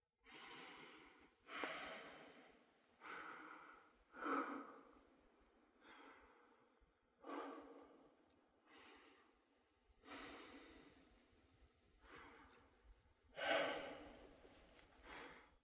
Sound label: breath ok; Escape from Tarkov